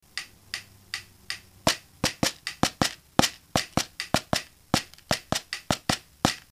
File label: Rhythm